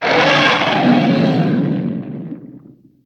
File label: Gorilla Roar; Garoga